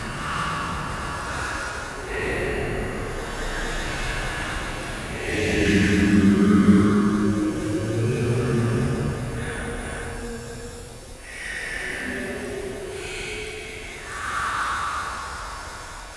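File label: haunted house with creaking; ghostly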